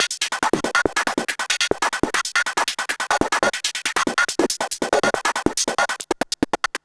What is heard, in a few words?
Electronic Perc; Drums & Percussion; Percussion Loops